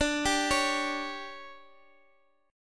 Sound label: D Major 7th Suspended 4th, Guitar, Drop D